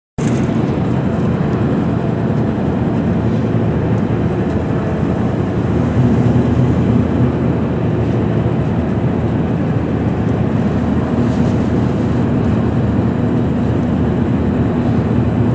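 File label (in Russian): звук автобуса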